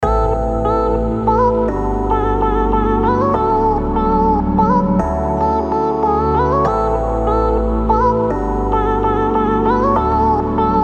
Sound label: Future Bass Chill